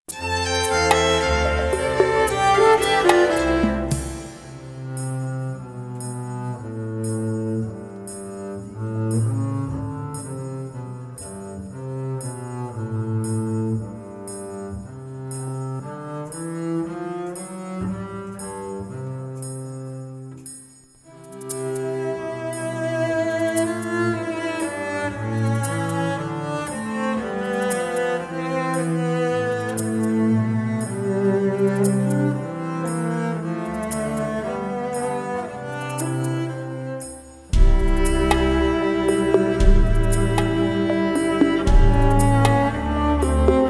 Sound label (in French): souris instr